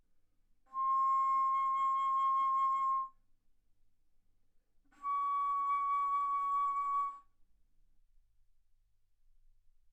Bass Flute C6 Db6; ff

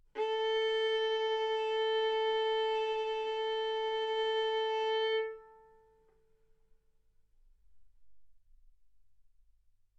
Violin arco mf sul A A4 B4 stereo

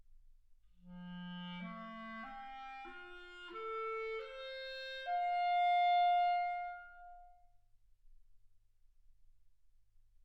clarinet

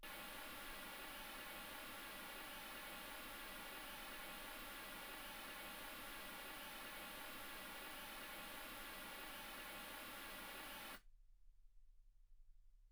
mls16 48kHz

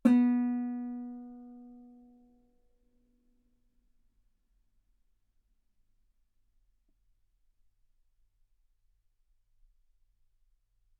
Guitar ff sul B B3; single mic

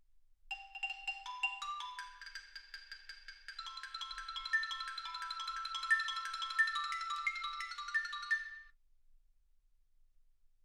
xylophone

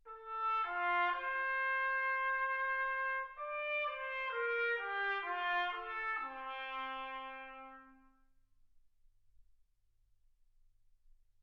trumpet

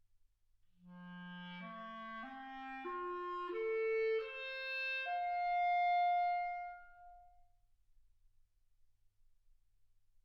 clarinet

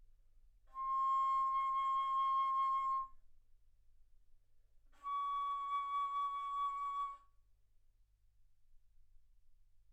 Bass Flute ff C6 Db6

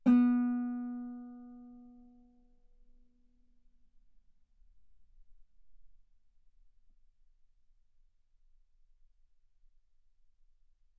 Guitar B3; ff; sul B

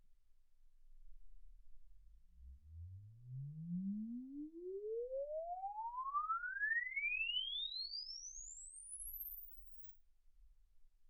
exp swept sinus 10Hz 24kHz

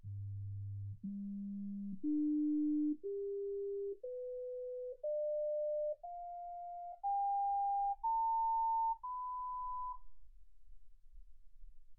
sinus tones 48kHz

